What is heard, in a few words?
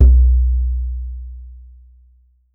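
large brass, dunbek; Voice Crystal - Steve Reid Definitive Percussion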